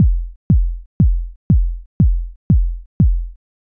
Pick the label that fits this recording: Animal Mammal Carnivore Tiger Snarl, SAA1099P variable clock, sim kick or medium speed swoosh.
sim kick